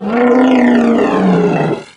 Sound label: sight, doom3 pinky